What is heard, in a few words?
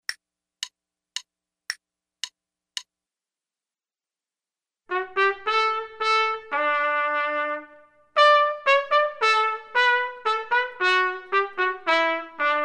trumpet; Selected Duets for Trumpet Podcast